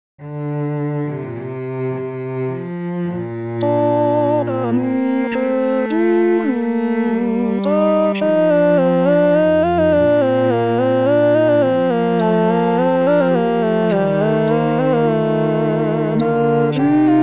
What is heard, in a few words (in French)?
tendre amour voix; 2022 st jean